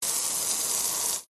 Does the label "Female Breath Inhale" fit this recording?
No